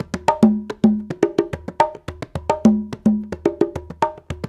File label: 108, Latin Congas; Spectrasonics; Supreme Beats